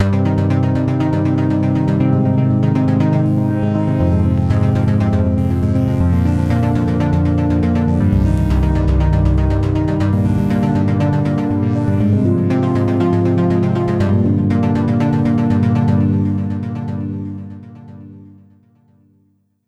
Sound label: Orb Synth